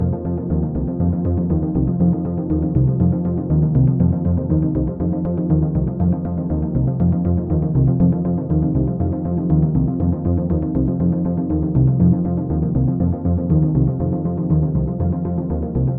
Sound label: First Ping Pong Delay with Second Added Before Sends